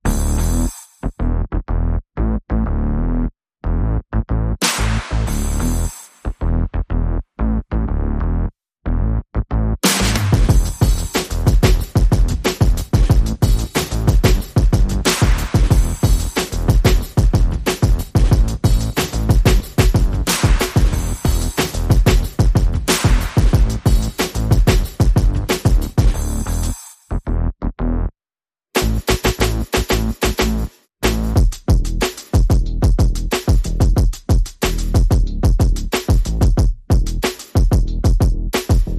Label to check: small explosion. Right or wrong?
Wrong